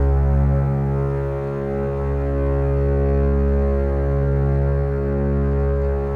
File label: C#1, Contrabasses